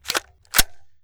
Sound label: Firearm AR15 Rack; Firearms; Zomboid